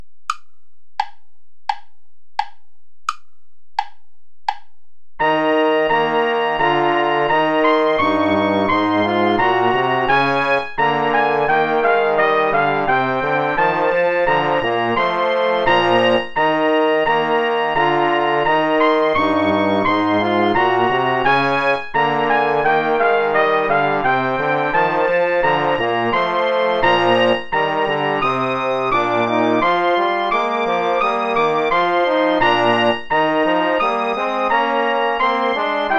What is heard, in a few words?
play along without trumpet